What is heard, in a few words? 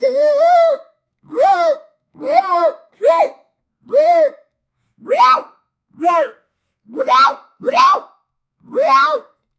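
a cute cartoon bear character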